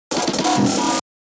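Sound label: drum beat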